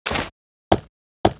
Goat idle; Seer; GamigoNA

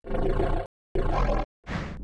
Dragon Horse Idle

